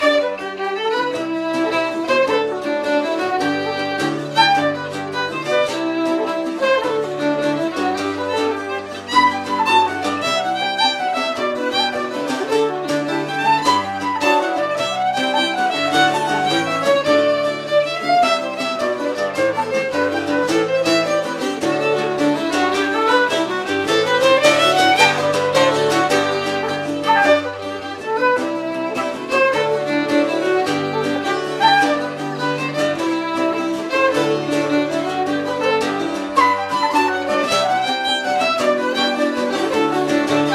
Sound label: Orchestra Ambience; Assassin's Creed III